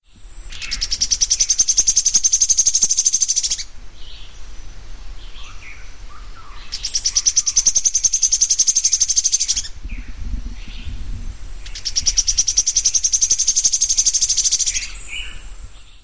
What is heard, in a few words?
Lochmias nematura; Intervales Park; Brazil